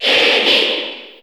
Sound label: Cheer English; SSBU